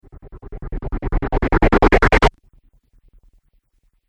Riser Abrupt Stop Phaser; Rise And Dive

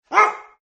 Dog Barks; Dogs; Sound Effects:Animals; Partners In Rhyme

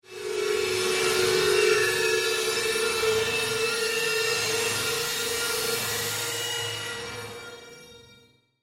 Horror Psychic Pain Dissonant; Blastwave

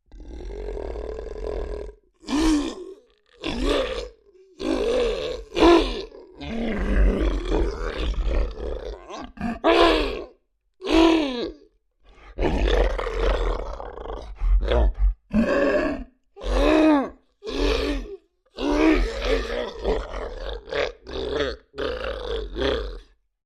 Creature Large Yelps Pain Distress; Monsters; Horror; BigRoomSound

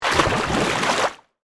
Water Gurgle Splash; BlastWave

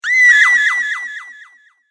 Female Scream Echoes; Screaming

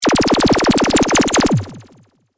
Laser burst long, Laser Burst: Long Pulse; Laser Sound Effects; Lasers (Sound Effects:Science Fiction); Stuart Duffield

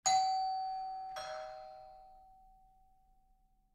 Doorbell Ring; Doorbell Ring Distant Perspective; Household; Rings; Sound Effects:Electronics

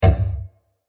Plastic Blast Hit; Blasts; Impacts

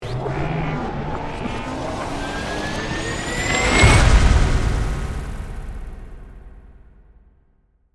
Sweeper, To, Hit, Digital; Sweepers

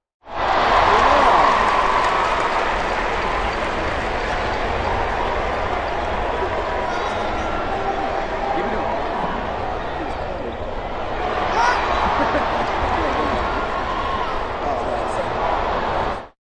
Arena Crowd Yelling, Arena crowd yells wildly.; Arenas

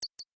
Beep Electronic Ping Double Step; User interface feedback sounds; Beeps; Multimedia; SoundEffects-Foundation1

